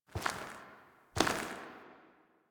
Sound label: Beam Battle Foley; Warframe; The New War; Ballas Lotus Beam Battle